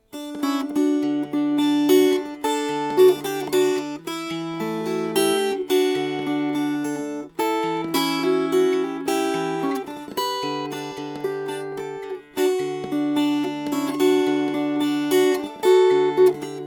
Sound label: Fingerpicked; Just As I Am